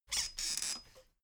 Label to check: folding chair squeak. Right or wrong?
Right